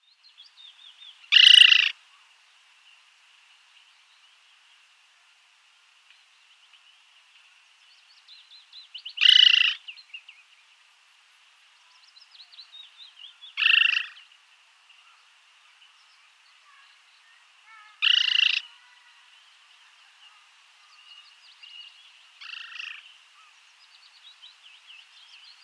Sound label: Pallid Harrier male display